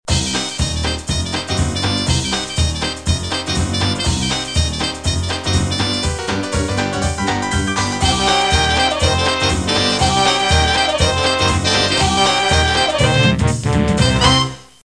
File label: drop Game Music